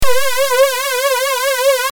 LFO SLOW

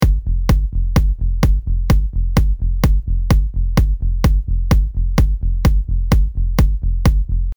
electro kick bass

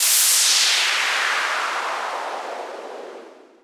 EDMS DOWN NOISE

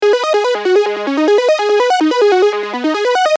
trance arp

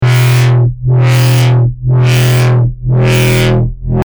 lfo sine

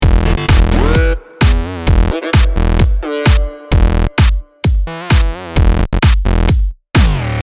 ELECTRO MIX

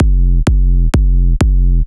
EDM bass kick

